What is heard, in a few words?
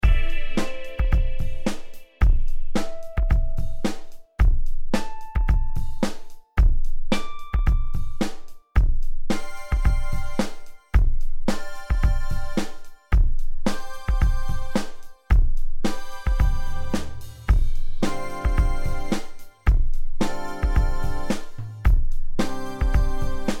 C110 Spirit Funk Groove